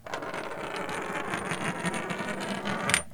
Rolling Metal Piece

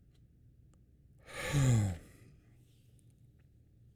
Deep Yawn

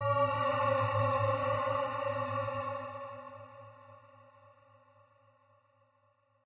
Hardcore Creepy

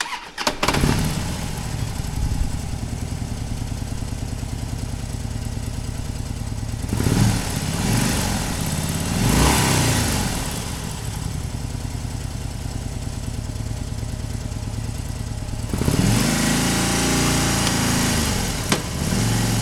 Harley Davidson Motorbike

